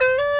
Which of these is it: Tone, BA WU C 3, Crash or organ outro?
BA WU C 3